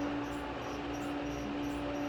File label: reel free spin